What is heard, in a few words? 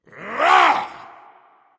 grunt; smite; Vulcan